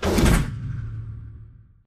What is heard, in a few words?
sliding door ext verb close start; Titanfall 2